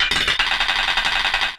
WOODPEC, Anime Sound FX; COMIC

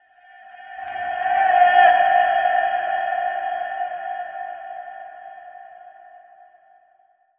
Vox, Trance; Sonic Foundry (Sony Creative Software); Trance NRG VOL-1

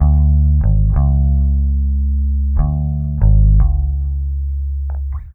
BASS, Loops; East West; Funky Ass Loops

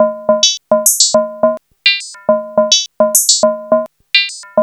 SYSTEM 100; AMG - Vince Clarke Lucky Bastard

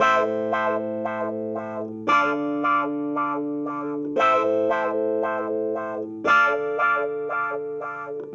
Cruisin' Disco Clavinet, 70s Analog Funk

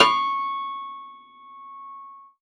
pno C4, Prepared Pianos, 10th Flageolets; Total Piano

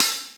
HH, HIHAT HIP, Dance Mega Drums; Best Service

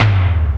tom low, Drums; Fairlight IIx

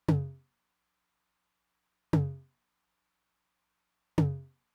high tom, Roland TR-909; Roland TR-909 Ableton Project; Processed; Crop

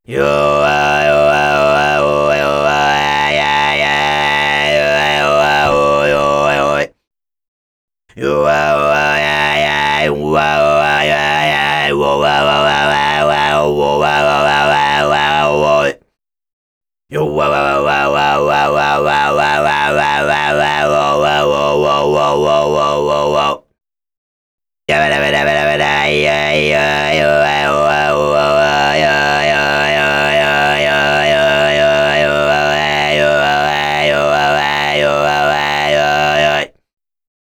Didgeridoo And Overtone; Good Stuff Audio

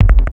FILL; PlatinumPhattCD1; E-MU Producer Series Vol. 8 – Platinum Phatt; Grooves Filz Hitz

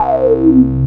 Dance Synths; Best Service ProSamples vol.37 - Dance Synths; Wasps

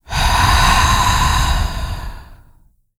VOX FX, BREATH FX; Spectrasonics; Vocal Planet; Jazz FX

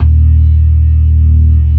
ORGAN, C3 NO PERC; Keith Emerson Signature Series

